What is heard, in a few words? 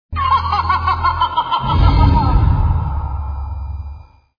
laughter; battlefield earth